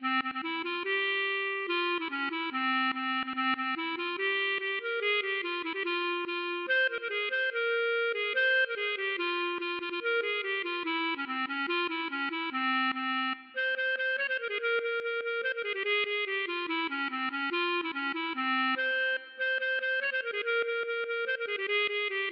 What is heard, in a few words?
klezmer